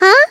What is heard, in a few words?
stab; freak fortress 2; hyperdimension neptunia; neptune